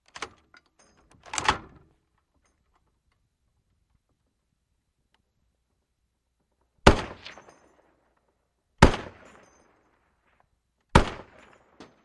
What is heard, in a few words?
.50 Caliber: Chamber Shell, Single Shots With Shells Dropping.